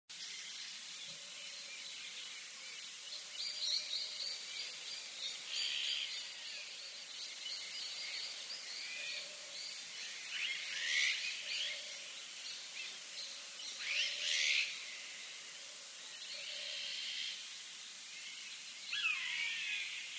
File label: Distant forest ambience